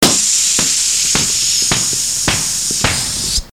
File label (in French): Crevaison pneu